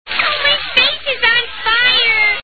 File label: face fire